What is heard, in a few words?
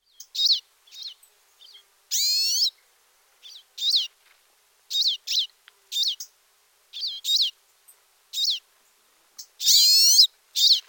pine siskin; birds; birds-and-frogs